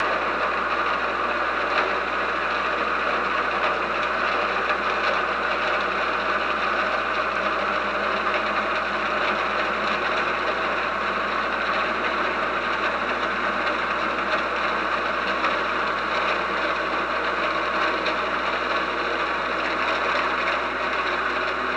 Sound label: OrcaLab